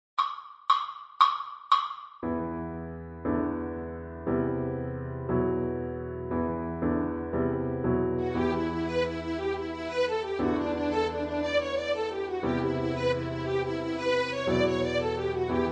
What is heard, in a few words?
Swallowtail Jig 116